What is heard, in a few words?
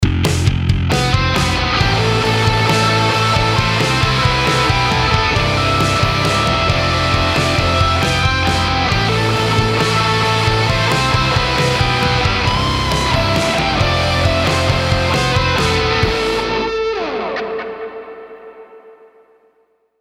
heavy rock